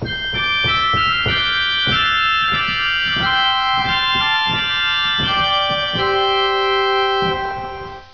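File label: Mixtur, organ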